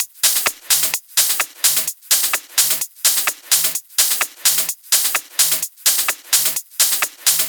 128BPM Kit; Vengeance Samples; Doubleride Kit

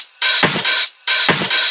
Loop 140 BPM; vengeance essential club sounds-3

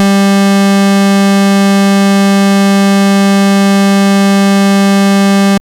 Pulse G3, Moog Voyager; Moog Voyager Sounds; VES1 Pulse

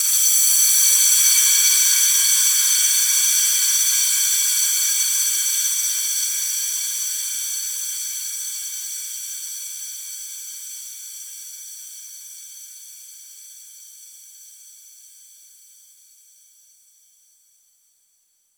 Downsweeps

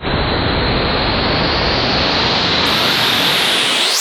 Sweeps Medium

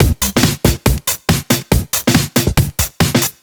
Breakbeat 140 BPM; Loops; vengeance essential club sounds-3; VEC3 Breakbeats

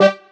Synth Cuts; Vengeance Samples; VES1 Instruments

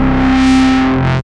Synth Sound; Vengeance Samples; VEE Synth Shots

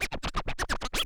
Cuts, Vinyl Record Samples

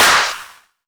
Clap; Vengeance Samples; VEC1 Claps

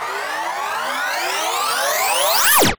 Uplifter

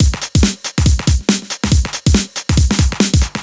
Breakbeat 140 BPM

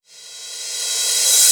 Cymbals Reverse; Vengeance Samples

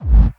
BD Gate FX, Bassdrums; Vengeance Samples

